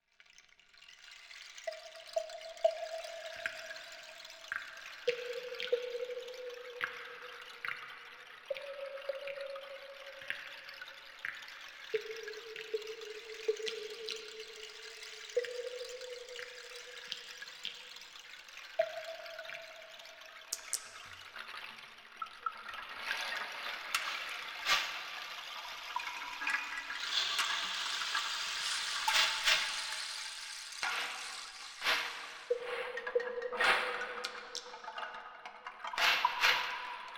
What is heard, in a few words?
The Murmur of Moss (ambience only)